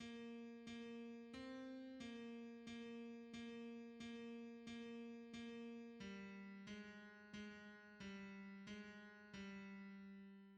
tenor; hymns; May the grace of Christ our Saviour

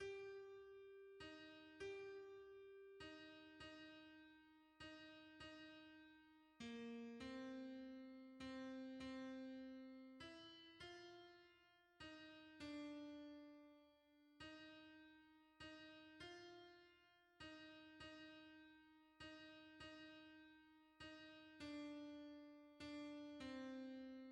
alto; hymns; May the Saviour s love and merit

